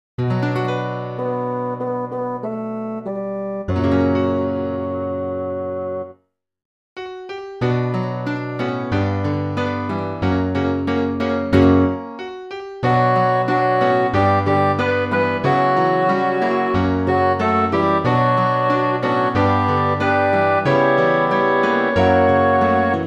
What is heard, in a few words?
save us savior english midi